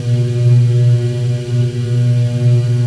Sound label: inside hum; Dystopia; vaccine